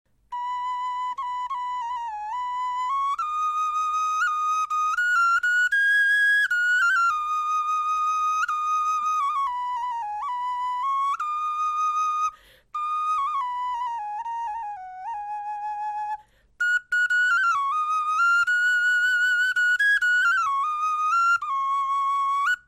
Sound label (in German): melodie, knochenfloeten